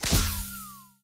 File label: wings; Bunnicula - Rescuing Harold